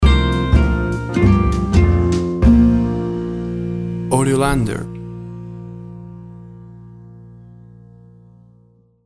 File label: Warm Paris Gypsy Jazz Night Stinger